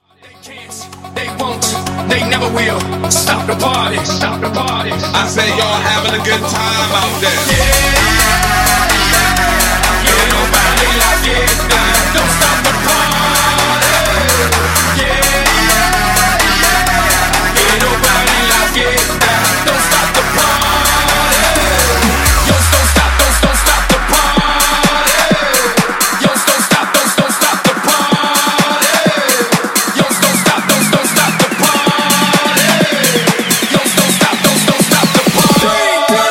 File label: Funk Wordplay Transition Dirty 128; 105Bpm